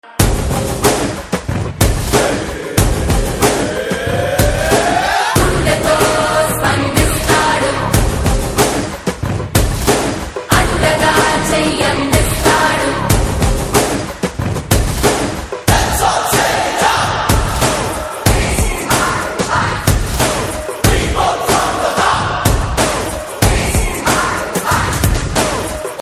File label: Drum Beats